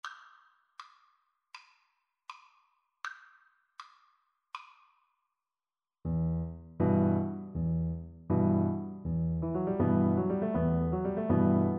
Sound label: Viola